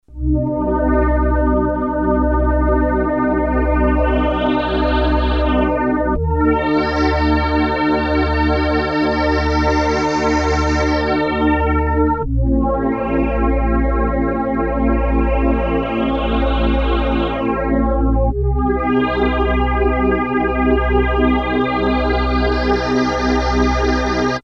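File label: pad resonance, analog polysynth; Solton Project 100; SSM 2056; EG ADSR; Solton SM100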